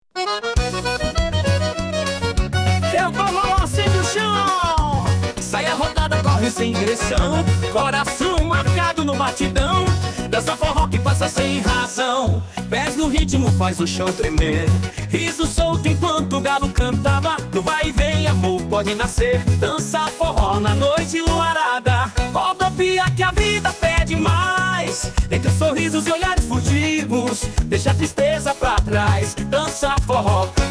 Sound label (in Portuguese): danca forro